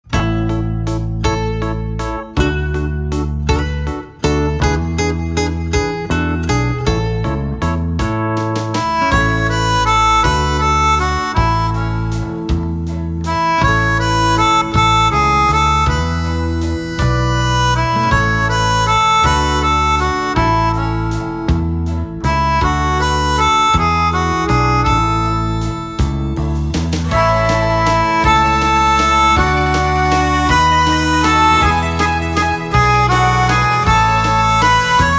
My Bonnie Is Over The Ocean To Play Along With, ukulele